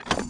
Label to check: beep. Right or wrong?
Right